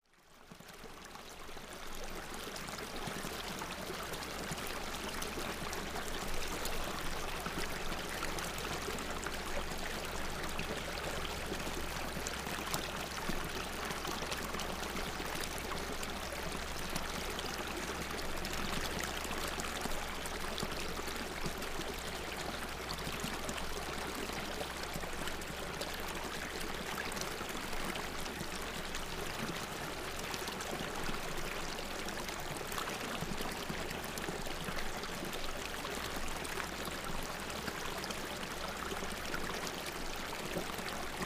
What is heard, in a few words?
Flowing stream; river